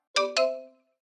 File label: calypso, nada dering iphone